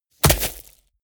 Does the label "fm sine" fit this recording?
No